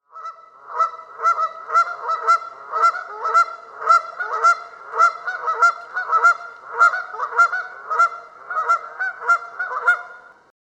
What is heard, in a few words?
goose canada, birdsong